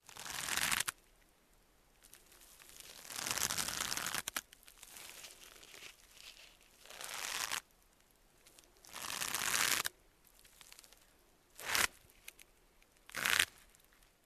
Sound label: Pulling of sticky foil